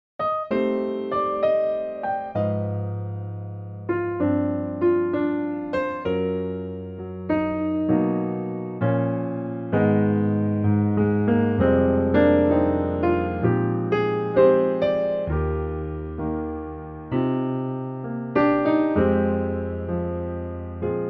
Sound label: piano